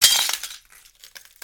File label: broken glass step; Golden Crayon Sounds